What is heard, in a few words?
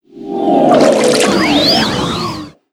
Heal rain; Fly For Insanity